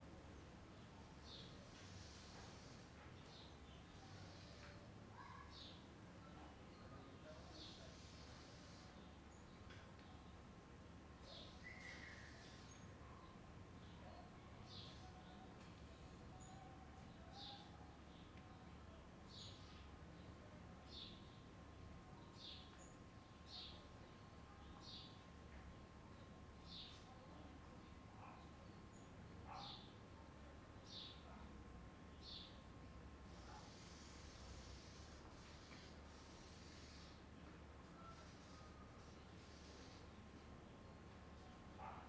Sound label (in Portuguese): Ambiencia bar vazio com pessoas e criancas longe, geladeira, cachorro